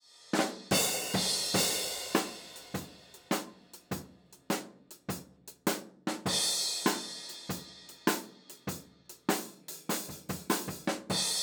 Overheads

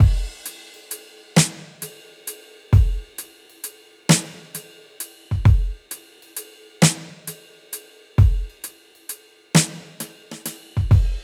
Blues Drums Slow Shuffle 132

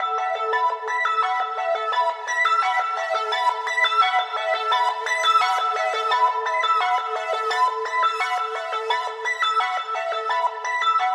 86 A Arp, Synthwave; Synthwave Loops; Flamingo; Futurism

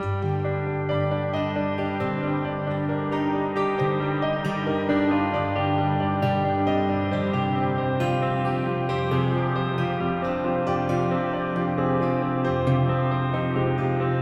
Orchestral Piano Dark Building G 135

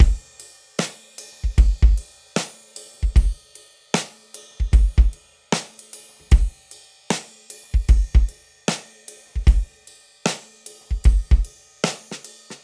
76 Acoustic Drums; Live Trip Hop Drums; Loops; Smoke Signal

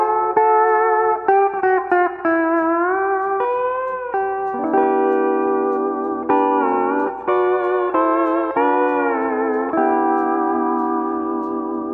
Steel Guitar

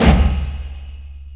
BANG, explosions gunshots

